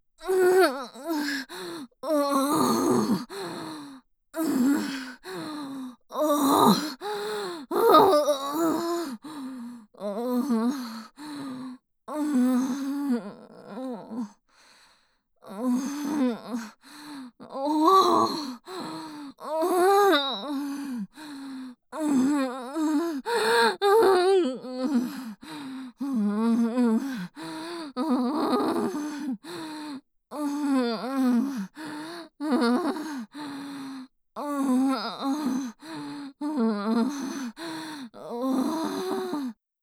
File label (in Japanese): 喘ぎ声(特大)